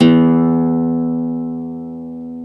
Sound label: GTR Nylon, Nylon Martin